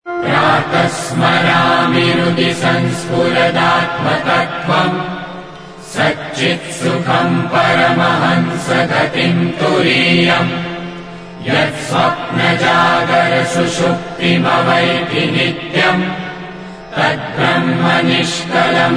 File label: himal chants